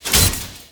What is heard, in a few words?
Crab Legs